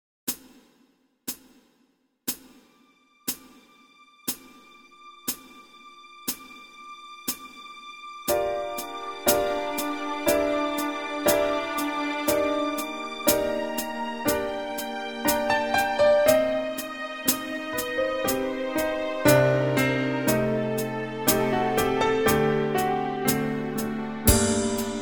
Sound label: brass minus